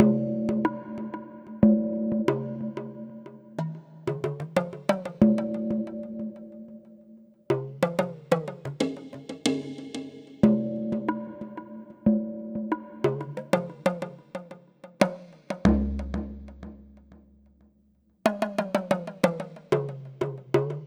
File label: Minimal Planet, Minimal Planet Drums; Ambient Cinematic